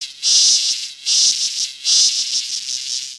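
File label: Pets Insect Large Beg; creatures; Lego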